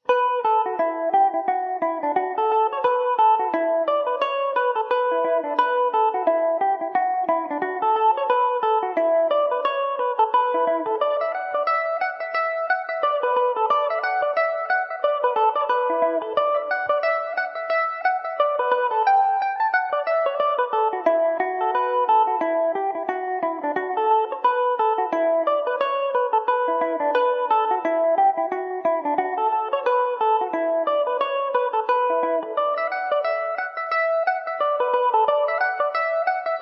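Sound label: E dorian, Irish mandolin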